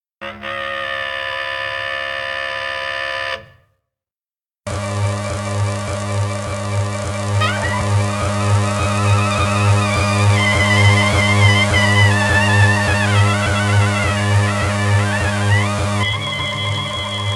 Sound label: Live Noise; Live At Metronom